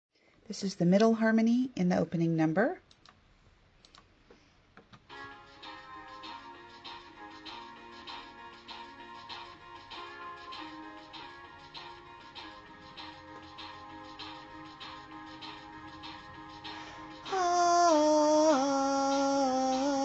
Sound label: cyber storm middle harmony